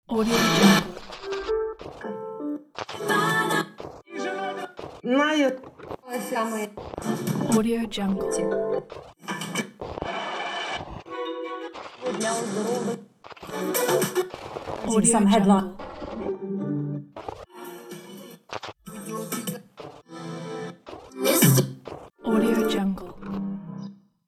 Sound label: tv channels switching